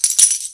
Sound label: printer